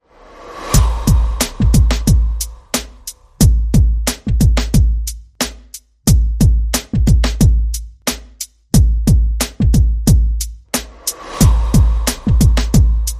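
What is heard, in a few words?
Percussion and FX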